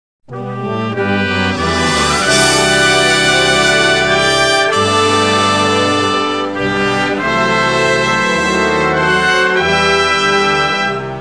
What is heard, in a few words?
jazz